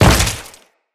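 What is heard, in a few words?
footstep on goo, enemies; Footsteps; Grineer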